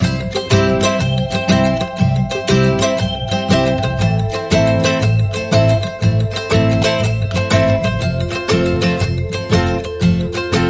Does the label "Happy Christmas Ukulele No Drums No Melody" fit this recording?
Yes